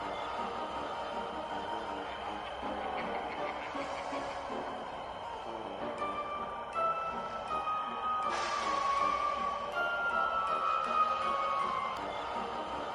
BGM Paytable; slots; Halloween Blast

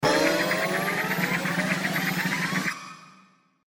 SFX Anticipation; Realm Saga

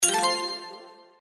Low Win; video poker

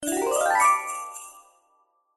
Win Symbol WILD; Christmas Blast

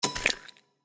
Bonus Choose Egg; slots; Robot Vs Monster

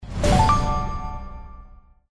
bonus coin slam; slots; Magic Coin

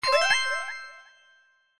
Win Regular; slots; Robot Vs Monster

